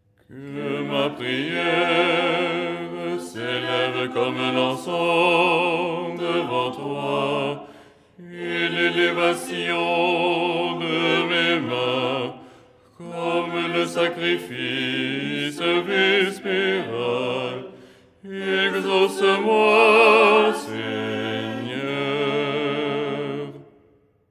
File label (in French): basse